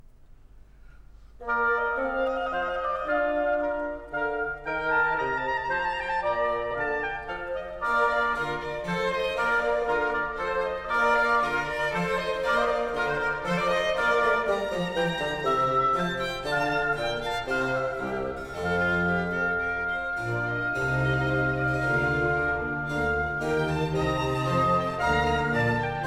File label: Concerto Grosso in B flat major; Handel Masterworks; Klassika